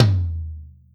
TOM; osu

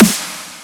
soft hit clap

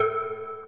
Arp; osu

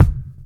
Great Drum

